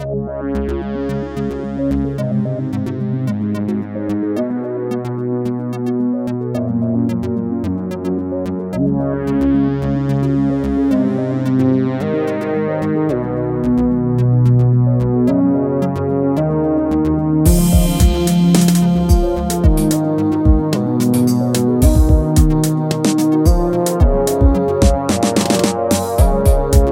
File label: Tape Lead; novembeat 2017